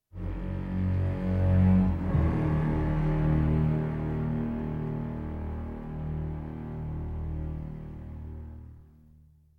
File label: ambient short; Chrome